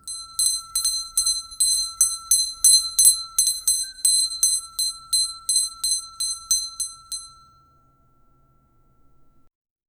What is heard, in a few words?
light school bell ring